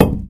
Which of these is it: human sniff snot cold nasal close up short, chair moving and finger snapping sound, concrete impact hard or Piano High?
concrete impact hard